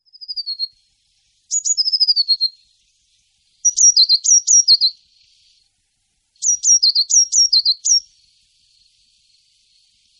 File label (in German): Blaumeise, Voegel; Parus caeruleus